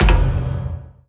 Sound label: pick remove fav, ui